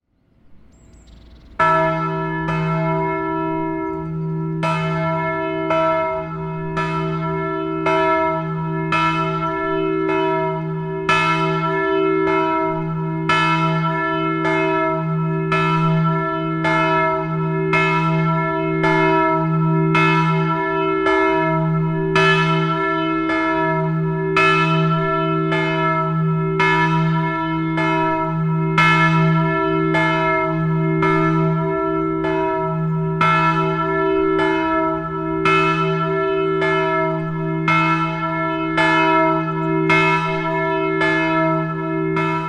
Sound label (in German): glocke; friedhofsverwaltung